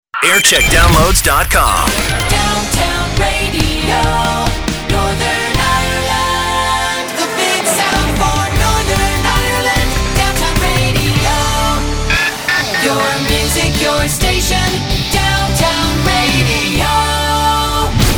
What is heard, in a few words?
jingle montage; downtown radio; 2011